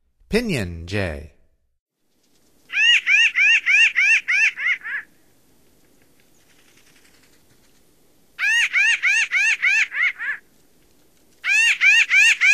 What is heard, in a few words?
Pinyon Jay, Songs of Birds of North America; Stokes